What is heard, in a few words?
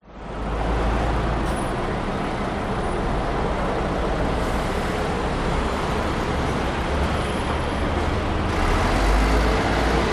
public places city amb morning traffic pedestrians; druitt st sydney cbd australia